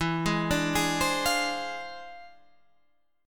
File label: E 7th Flat 9th Sharp 5th; E7b9#5; arpeggiated